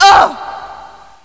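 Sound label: female ouch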